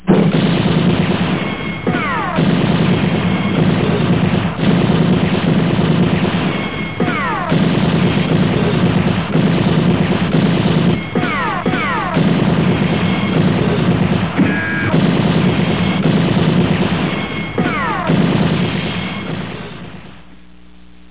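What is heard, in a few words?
machine gun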